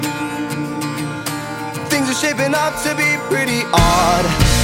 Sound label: pretty odd note